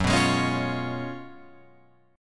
Gb Major 7th Suspended 2nd Suspended 4th Gb down Guitar Open F; GbM7sus2sus4 strummed; JGuitar